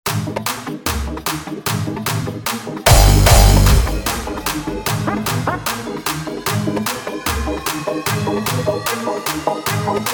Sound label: Swing it Hardstyle Bootleg